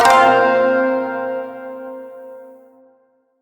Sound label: Shawzin Hirajoshi Chord K; Chords; Warframe